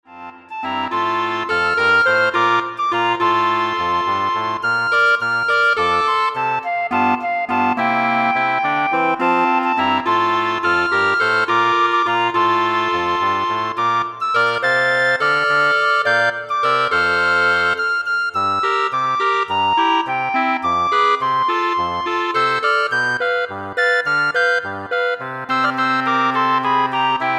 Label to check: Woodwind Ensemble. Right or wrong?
Right